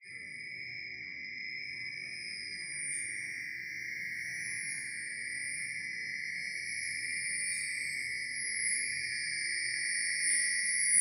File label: Sci Fi Atmos Scanning, ambience